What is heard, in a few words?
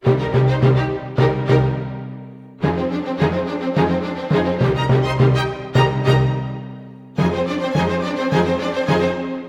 90 c min full mix, Orchestral arrangements; PowerFX - Downtown Orchestra